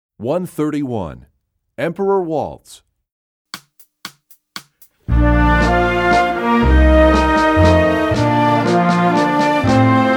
Emperor Waltz; EE2000 Book2

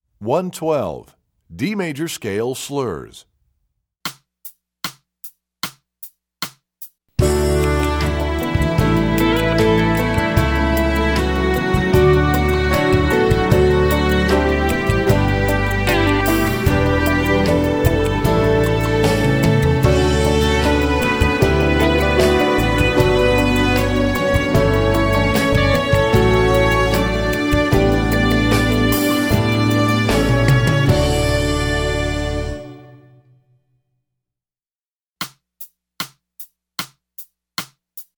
D Major Slurs, strings; EE2000 Book1

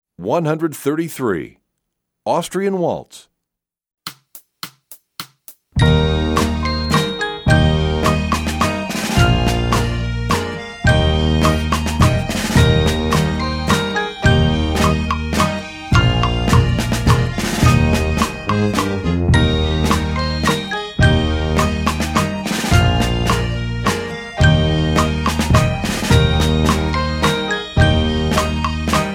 Austrian Waltz, Percussion; EE2000 Book1

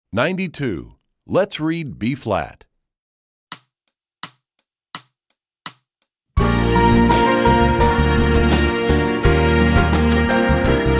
Lets Read B flat, strings; EE2000 Book2

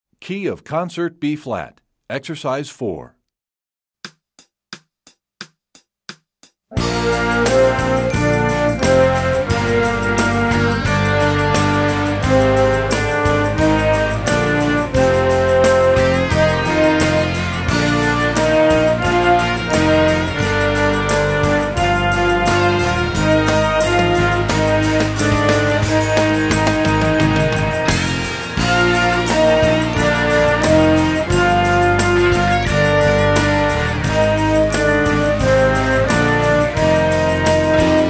Concert B flat; EE2000 Book1

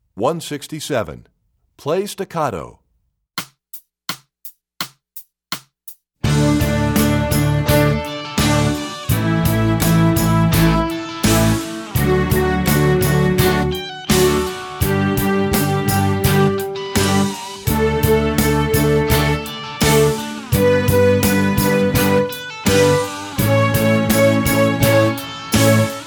Play Staccato, Strings; EE2000 Book1

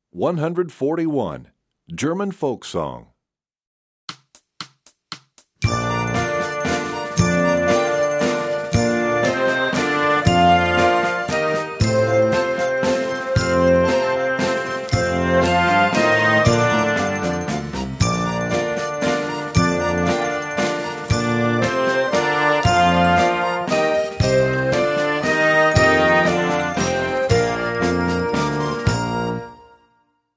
German Folk Song; EE2000 Book1

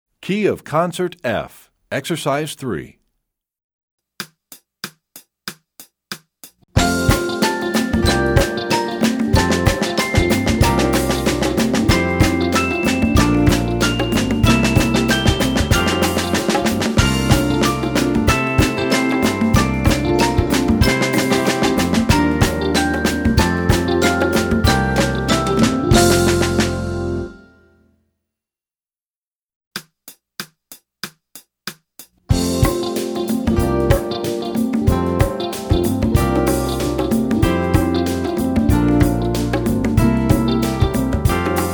Concert F, Percussion; EE2000 Book1

